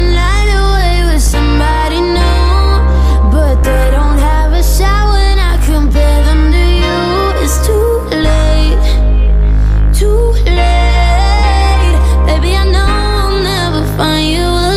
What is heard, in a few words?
romantic english ringtone